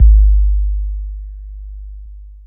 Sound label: SYNT BD; E-Lab; X Static Goldmine 2; akai